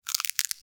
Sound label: chip crunch